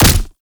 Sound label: Fulmin Prime Punch, Weapons; Warframe